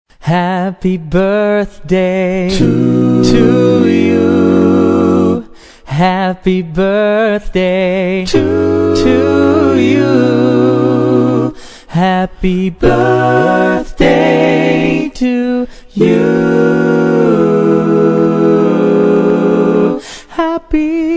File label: Happy Birthday A Cappella